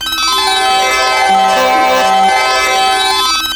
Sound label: ETH SITAR; AMG - Now CD-ROM (Roland)